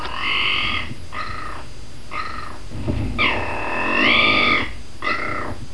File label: raicho voice